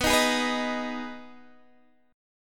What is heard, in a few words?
B 6th B down Guitar Standard; strummed